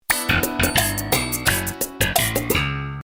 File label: sitcom transition bass riff; seinfeld